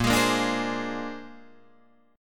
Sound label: A sharp 9th A sharp, Guitar Open D